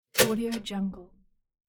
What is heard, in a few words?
toaster